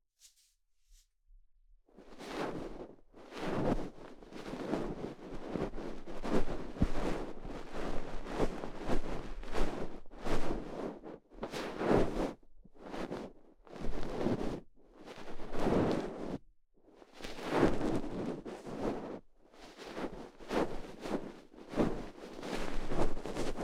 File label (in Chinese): 掏耳 右, ASMR